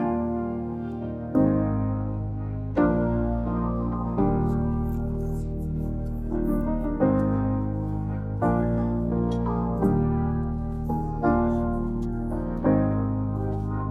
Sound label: cassete music generator; Google